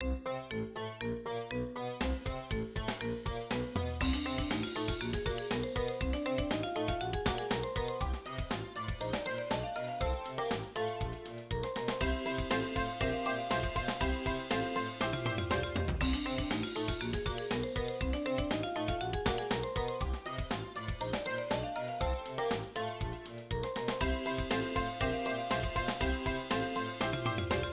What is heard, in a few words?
drums funk